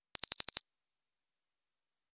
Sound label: ringing chirp; standard ringtones